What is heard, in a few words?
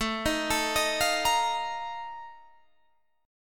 Bb Major 7th Suspended 4th, A, chord